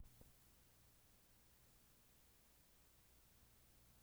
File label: farfisa